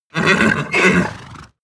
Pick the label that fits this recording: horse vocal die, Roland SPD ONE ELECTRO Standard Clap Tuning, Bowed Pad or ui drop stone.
horse vocal die